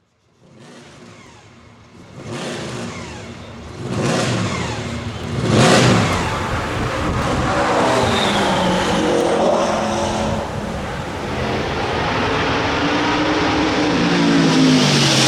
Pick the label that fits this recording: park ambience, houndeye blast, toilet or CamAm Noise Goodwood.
CamAm Noise Goodwood